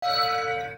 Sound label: button hover buy shop now